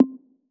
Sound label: Volume Incremental; Essential PH-1